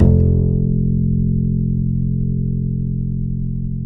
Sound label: F 2, Bass; Spectrasonics; Bass Legends